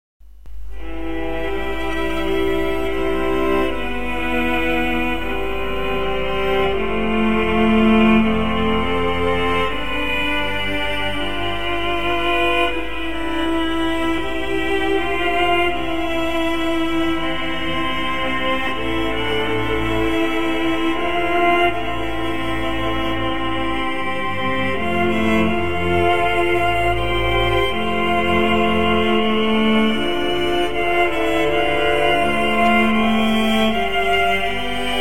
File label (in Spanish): cuarteto